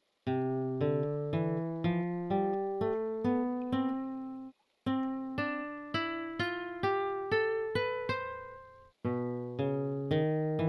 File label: Lute Sound; Lute of Ages